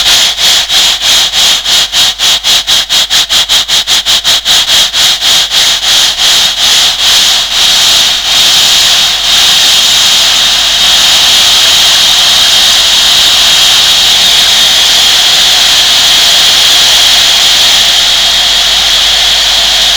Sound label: running